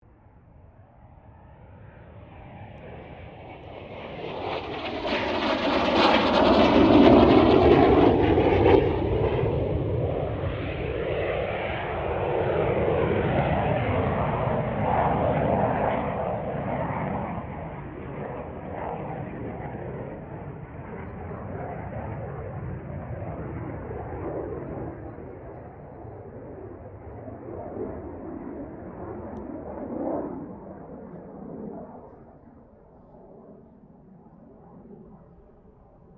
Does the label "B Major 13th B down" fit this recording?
No